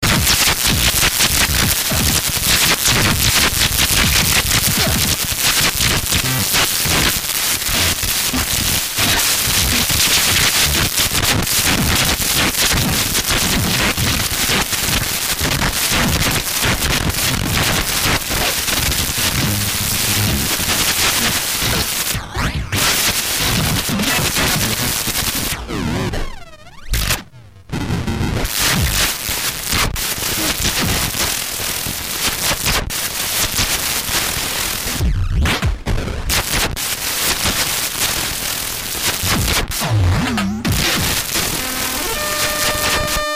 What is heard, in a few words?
noisy box o clatter